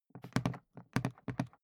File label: briefcase plastic handle handling quick soft; industrie wirtschaft; bueroarbeit